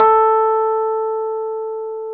RHODES A3; Sonic Reality - Vintage Timetraveler

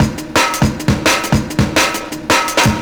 Beat, Drum N Bass

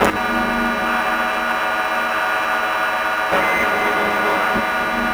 Geared Up Noise; Sonic Foundry (Sony Creative Software); Loop Noir

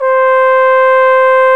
Solo Brass, Trombone, Alto Bone; BRS Alto Bone

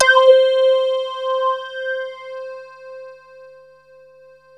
BASS A, MORPHEUS; Syntec; Wall of Sounds

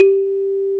THUMB PNO G3; AKAI S-Series CD-ROM Sound Library VOL-2; W+GLOCK

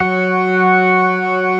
STOPS, organ combos; Keith Emerson Signature Series

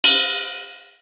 Cymbal, Drum One Shots; AMG - Coldcut's Kleptomania VOL-1

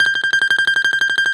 BALALAIKA, Russian Strings

